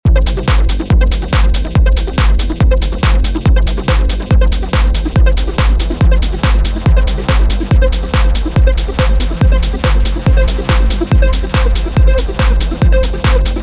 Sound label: Hard Bass and Lament Arp; neo retro 8 bar loops and midi files